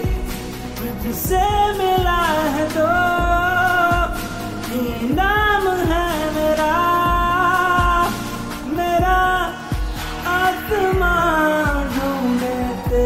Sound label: Sad Bollywood Song Ringtone